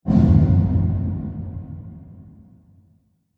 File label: Impact Epic; Miscellaneous Impact